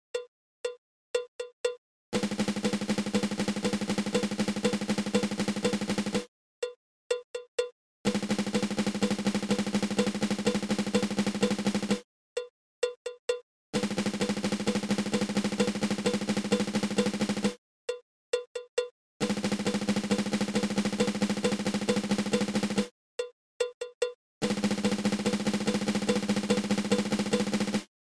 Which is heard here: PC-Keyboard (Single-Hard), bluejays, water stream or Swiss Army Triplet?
Swiss Army Triplet